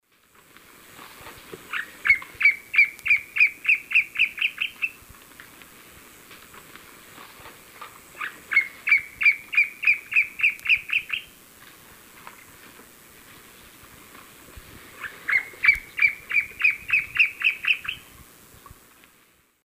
Cercomacra serva, song, Eastern Lowlands, S. America